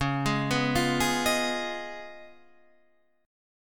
C sharp 9th C sharp down Guitar Half Step Down, chord